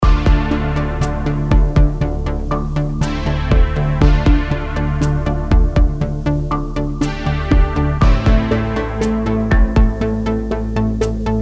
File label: Juno X vs JupiterX